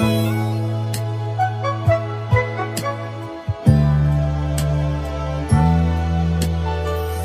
strings, loops, phonky

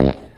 fart short

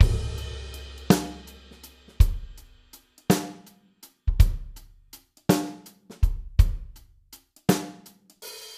six eight, drum loops boombap

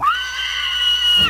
pig; Animals

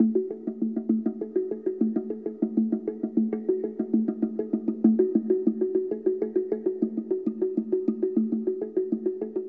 distant tribe, Drum loops

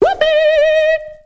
whoopy; ooh ahh; ho hoo woo

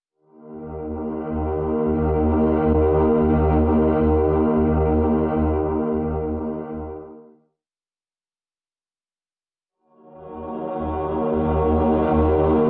passing winds; Loops; Electronic

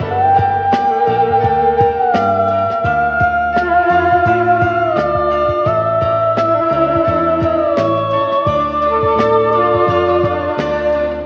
full voiced right in the middle, Loops, Phonky

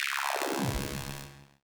jeep; Zaps and Beeps

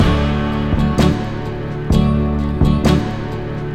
guitar caustic

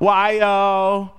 whyoh, Voice ooh ahh

